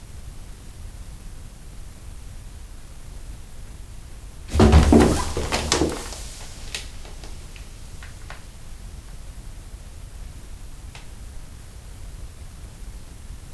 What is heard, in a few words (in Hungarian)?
tigrispiton szájával rákap a nyúlra gyorsan rácsavarodik; állathangok; miskolci zoo 2009